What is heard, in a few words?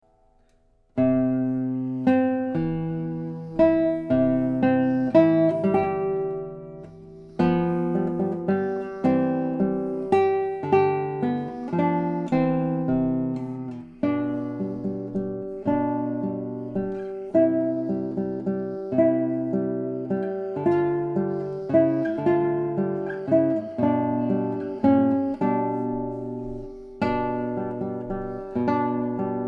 Waltz, guitar; D. Aguado